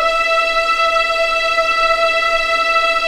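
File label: E5 legato piano, violins; East West; The Ultimate String Collection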